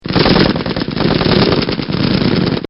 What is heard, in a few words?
Machine Gun And Rifle Fire; Military